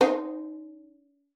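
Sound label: finger D#4, violin; Xsample VOL-5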